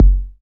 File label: clean kick drum f sharp key; kick drum samples